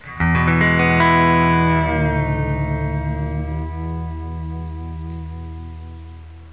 GUITAR

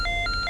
teleport; Spheres 1.0

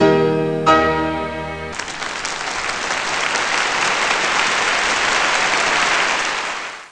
Grand Piano

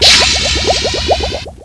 bloup; MOBICLIC41

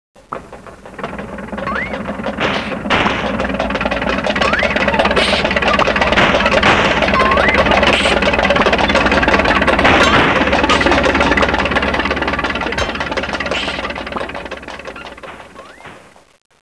OLD CAR

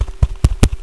Walking approach; Curse of Dragor

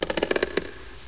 CRANK

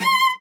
Cello stabs C5

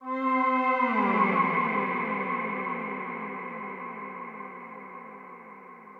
SYNTH PAD AMBNT 160 C, Ambient; eJay; Sphere

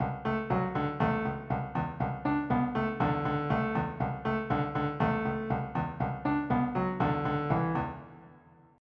Reggae

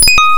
ack; SteelFortress3

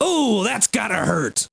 hurt; tdordemo

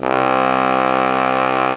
trombone C2; Star Trek JR Audio

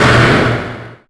door slam; Thief II

